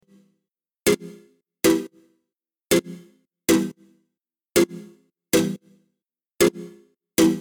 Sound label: Fm Stab, 130